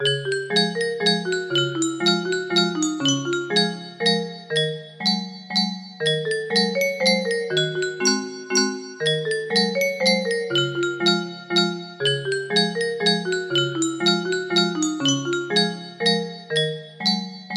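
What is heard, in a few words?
waltz, music box melody; die fledermaus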